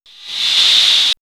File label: SciFi Nerve Gas; X-Static Goldmine